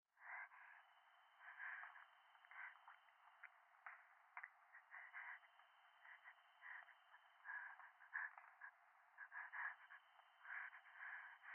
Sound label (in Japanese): 右耳快感吐息 山奥